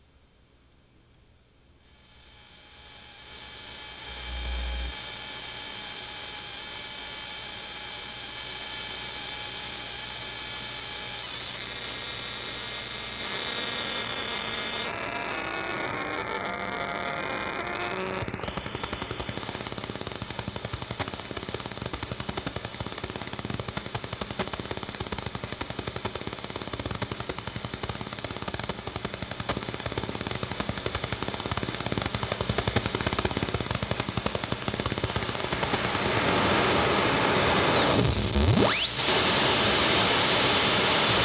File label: noise gen